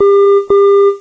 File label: bip